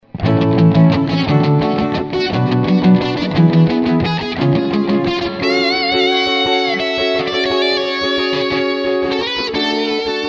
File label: crying, guitar; Очень красивый перегруз для соло